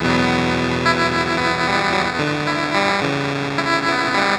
pedals bitcrush

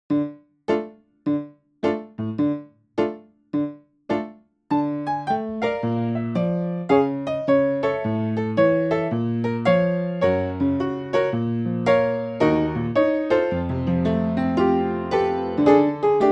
pianos upr; basilica pianos v 1 3

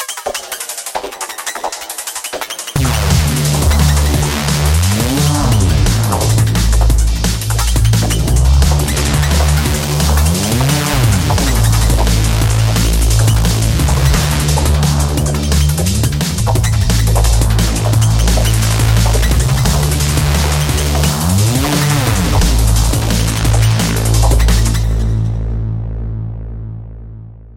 reese bass c

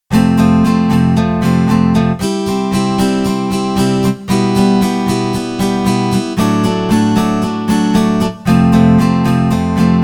strummer

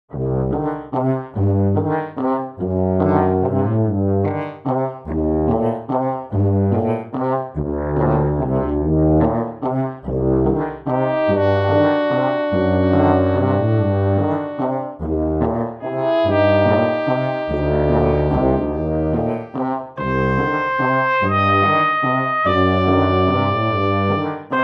sneaky brass

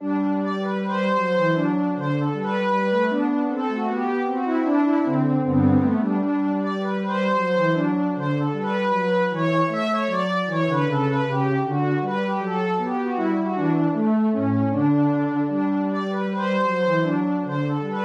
prophet t8 strings

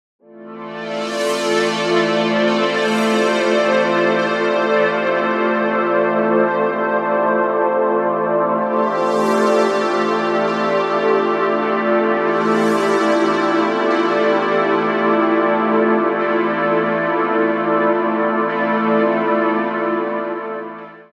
electric flesh pad

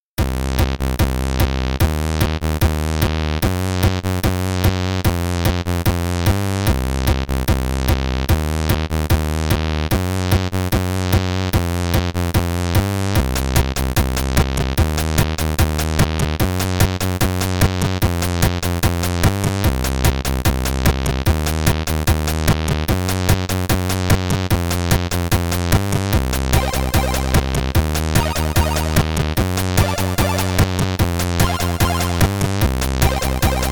sid sounds

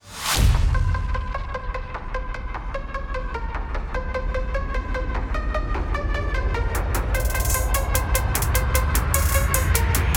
dubstep and trap

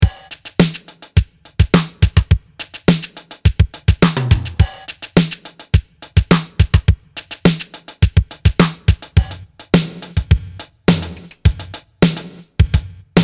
synth pop

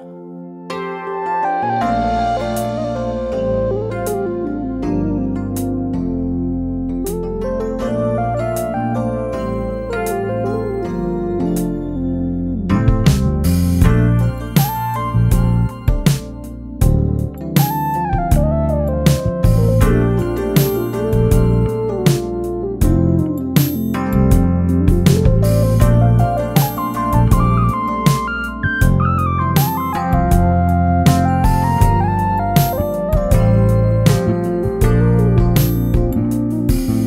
rhodes; rhodes affair 2

